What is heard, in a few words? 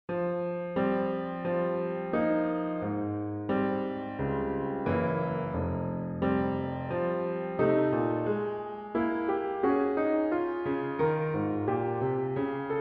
Cello Chorale